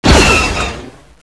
gluongun zap; svencoop; custom weapons

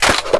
m82 mag out; m82a1; svencoop; custom weapons

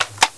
reload, uzi; svencoop

